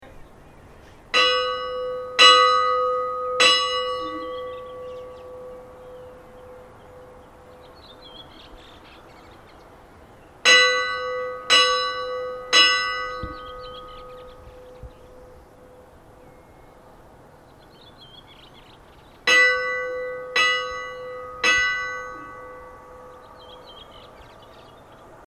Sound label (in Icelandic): kaupangskirkja 3x3, kirkjuklukkur